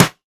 Good Snare Single Hit E Key, Royality free snare tuned to the E note; snare drum samples